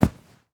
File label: Weather Snow Impact Body Dropping On Snow; AdobeCC